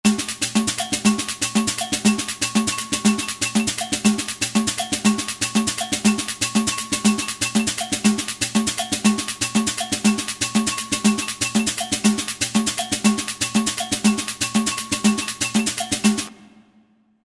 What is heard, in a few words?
120 Mixed Lp; World; Latin; Roland SR-JV80-18 World Collection Latin